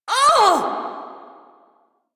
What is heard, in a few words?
Pain Lich B